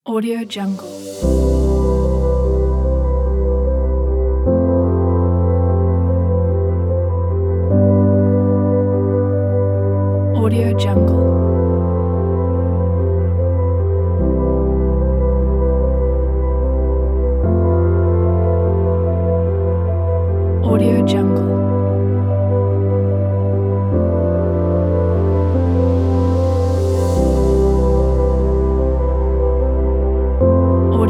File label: uplifting calm